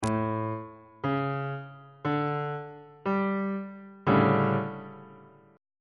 c double sharp major 7th suspended 4th 2nd inversion on bass clef